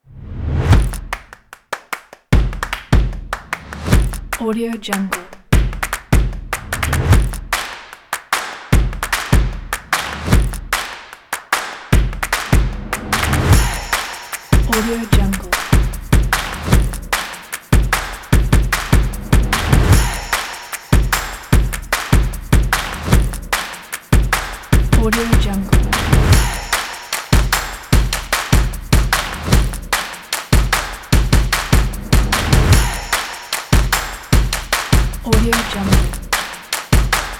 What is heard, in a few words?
percussion